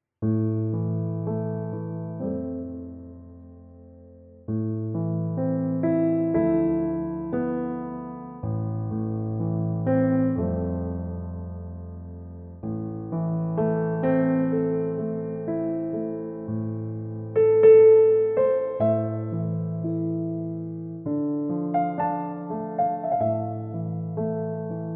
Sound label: Piano